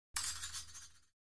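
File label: Fencing Swords Cross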